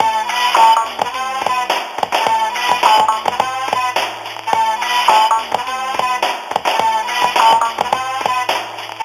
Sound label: Boombox Hip Hop